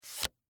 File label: Game Card Remove, From, Stack, Small, Card; Sports, Board, Game, Card, Remove, From, Stack, Small, Chance, Card; Board Game Sound Effects